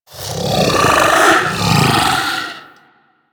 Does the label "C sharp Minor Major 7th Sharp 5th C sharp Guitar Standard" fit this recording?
No